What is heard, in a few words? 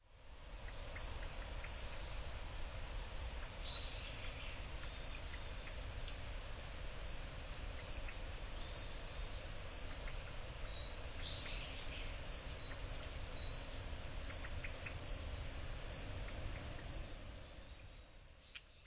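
Red-flanked Bluetail; hyogo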